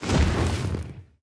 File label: jump fireball